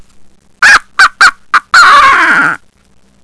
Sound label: rooster crow